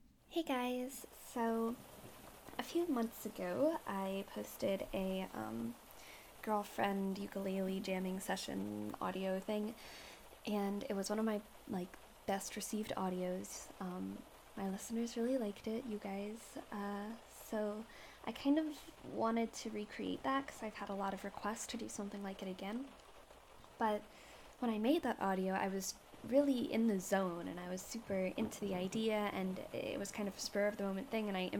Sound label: Some Rainy Ukulele Jamming, rain thunder sounds; just a few unedited songs